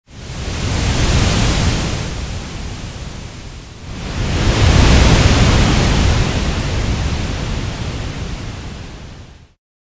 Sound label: beach wave; town